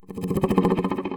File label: taunt start growl; smite; cthulhu